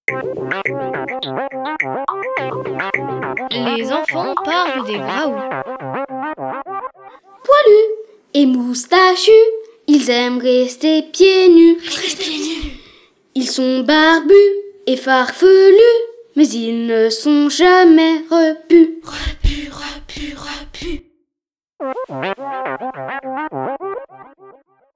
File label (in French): graouuu pieds nus